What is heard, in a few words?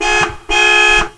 horn truck